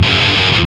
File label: GTR; Roland - LA Composer vol. 2